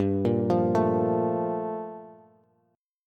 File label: G Minor 9th, Bass; Gm9 arpeggiated; JGuitar